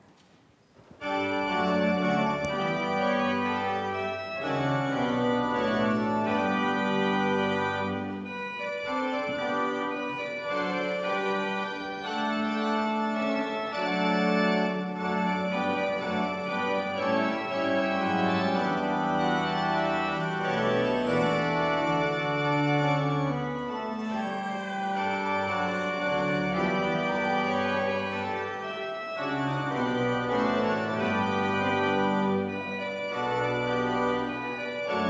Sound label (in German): Orgelnachspiel; planitz